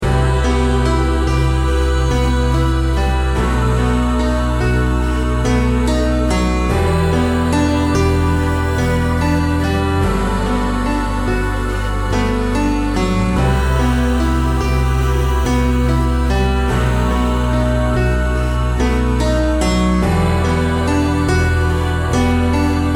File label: Healing Melody